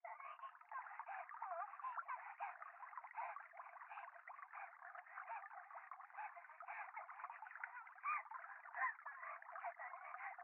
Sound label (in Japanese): 可愛い喘ぎ 川のせせらぎ